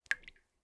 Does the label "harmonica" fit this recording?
No